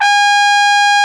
Trombone C4

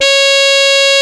Sax F3

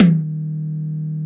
Acoustic Tom

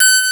Harpsichord Bb4

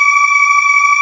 Aahs F4

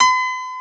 Piano Ab3

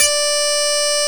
Strat F#3

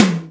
Room Tom Low

